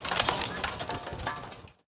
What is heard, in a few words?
Equipment Debris Underlay Play